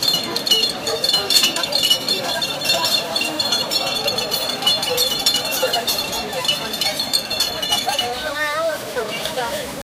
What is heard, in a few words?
busy outdoor market with, produce